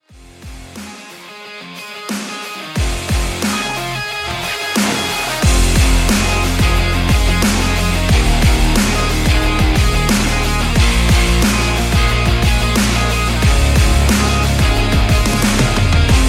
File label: Powerful Rock Instrumental Ringtone